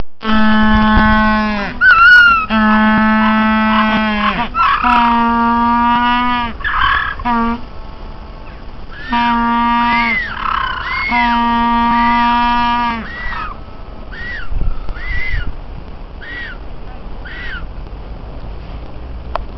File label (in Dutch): humboldt, pinguins